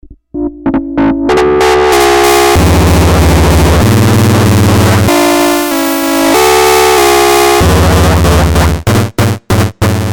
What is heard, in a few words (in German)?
Monark Seq Krach